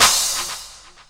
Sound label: CLAP, Dance Drums